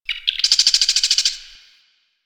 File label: Wren Bird; Warframe; The New War; Zariman; Apartment